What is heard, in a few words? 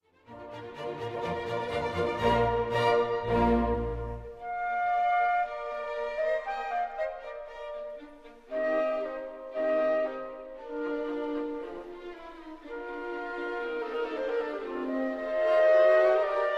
Clarinet Concerto Solo; Mozart